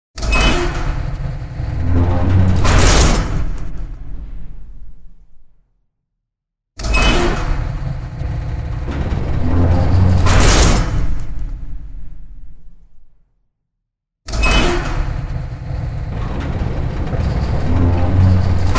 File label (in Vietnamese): dong mo cua cong bang kim loai